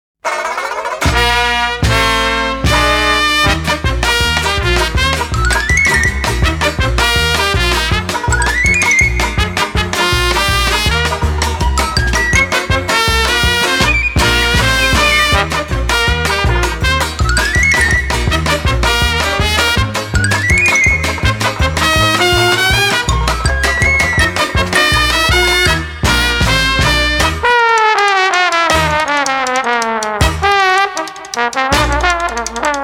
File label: Funny Circus Band, Slapstick Comic